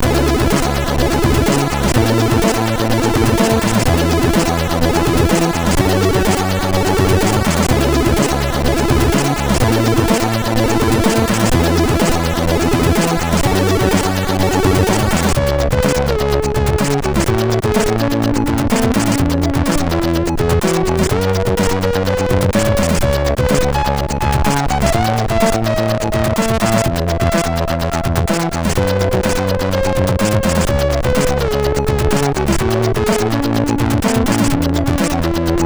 Intro Sound, sid, MOS6581R4

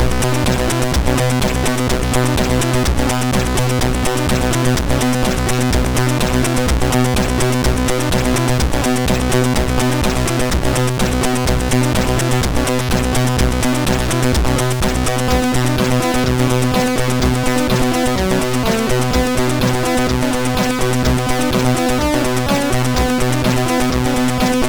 sid MOS6581R2, GAMES; Catalypse

